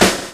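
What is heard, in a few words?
drum chop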